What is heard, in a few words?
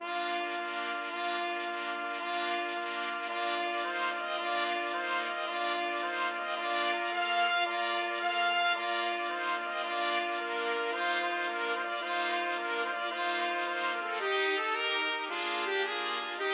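Serenade trumpets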